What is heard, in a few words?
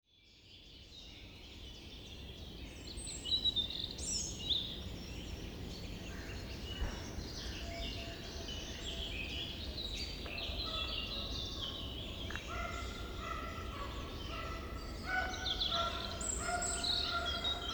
forest